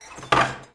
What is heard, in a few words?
Target Up; This Is the Police